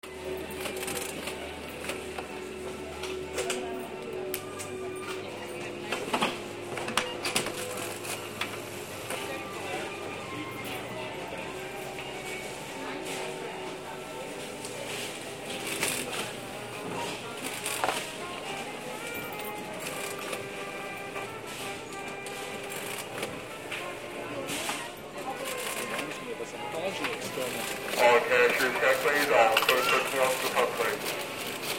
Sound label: Supermarket ambience